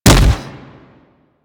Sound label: Impact Flesh, Weapons; Warframe; Furax